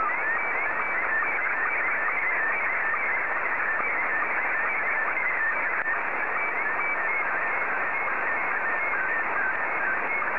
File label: sstv